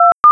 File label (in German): Anruf Beim TKI